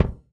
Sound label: Thump, Kicks; Airwindows